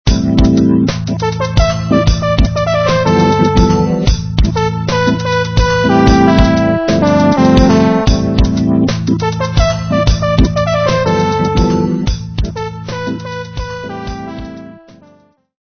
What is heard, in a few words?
Scene Transition, transitions